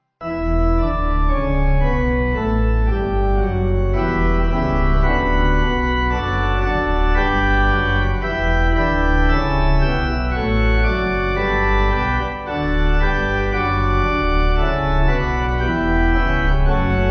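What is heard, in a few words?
Bells Pipe organ, church music backing; On This Day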